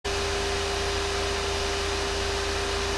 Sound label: chrome orb, socket a fan